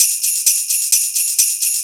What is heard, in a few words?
hihat 2, 130, drum loops; Ueberschall; Techno Trance Essentials